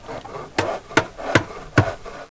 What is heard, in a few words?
furniture workshop; Caesar III